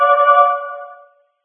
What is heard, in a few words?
phone ring caller; There; ThereClient